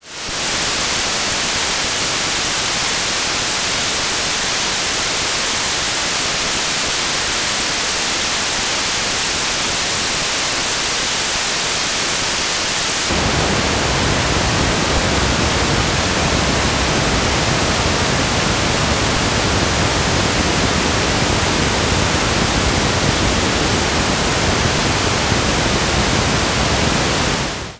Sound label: white pink70 lo